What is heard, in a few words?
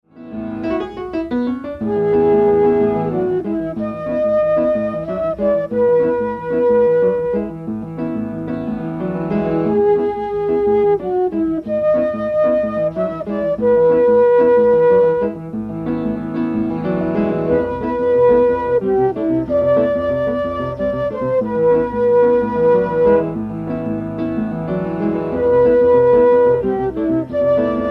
Piano 2 Flutes